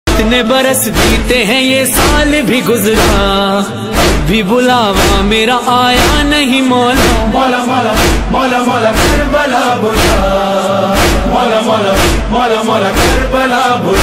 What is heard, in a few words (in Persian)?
نوحه